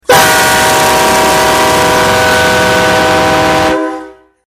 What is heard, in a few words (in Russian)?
Гюдок поезда